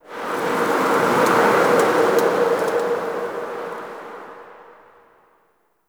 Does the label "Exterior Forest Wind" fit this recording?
Yes